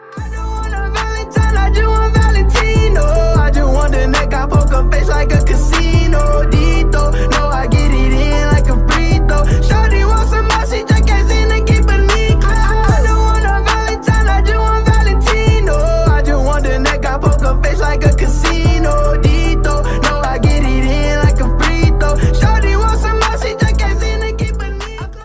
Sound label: English Ringtone